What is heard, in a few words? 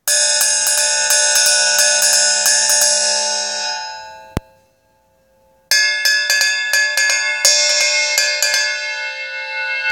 15 zp bottom, drums